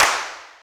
hand clap sound c sharp key, hand clap sample tuned to the C# note; single hits one shots